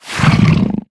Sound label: wound